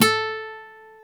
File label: GTR Nylon, Roland; NylonMartin; Club-50 - Foundations